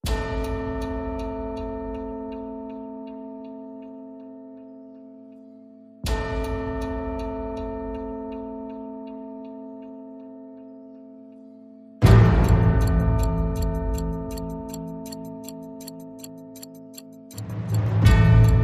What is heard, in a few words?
Clocklike A